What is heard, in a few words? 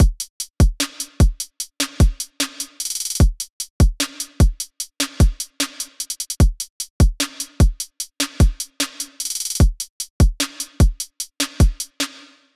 Drum Loop, 150 BPM; Cymatics; Light Free Sample Pack; Light Samples